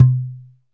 PER Percussion; Mix-Percuss

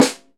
Piccolo Snare mf; Digital Sound Factory Virtuoso

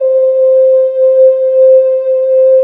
SYN, 170 BPM; Ueberschall; Drum & Bass Flash Trackz

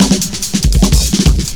LOOP FX, Big Beat Drumloops

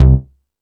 Hip House, drum machine

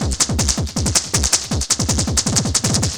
Vocobeat; Sonic Foundry (Sony Creative Software)

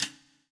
POWER RIM

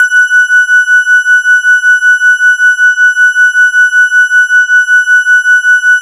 E-HARP, DX HARP; Wizoo - Powered DX

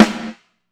GATE, SNARES; Sampleheads - New York City Drumworks VOL-1

